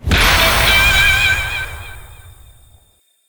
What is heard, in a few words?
wild Transform